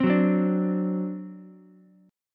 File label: C Suspended 2nd Flat 5th Ukulele, Csus2b5 chord; Csus2b5 strummed